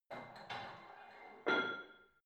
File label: barbell plate put on hit ring reverby soft, fitnessstudio